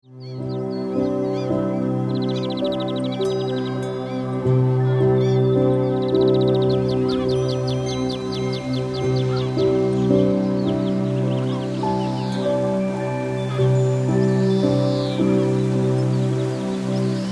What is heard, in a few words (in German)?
Entspannungsmusik